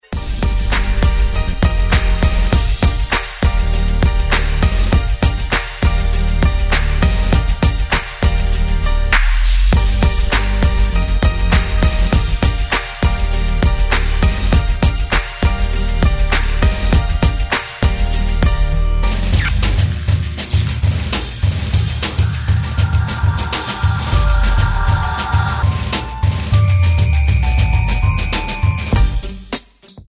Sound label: karaoke; disney